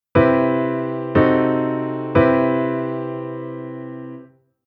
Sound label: Chord dim7